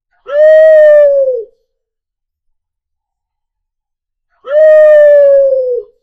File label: various owl screams, forest